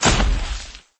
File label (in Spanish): caida